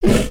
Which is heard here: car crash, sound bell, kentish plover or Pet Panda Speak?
Pet Panda Speak